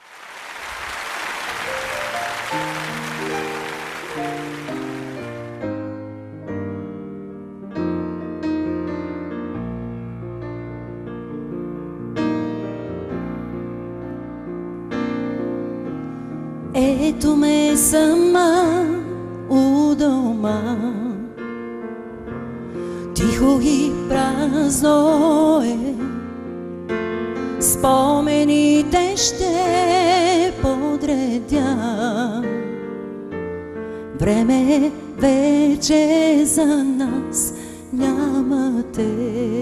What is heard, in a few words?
Acoustic, BULGARIAN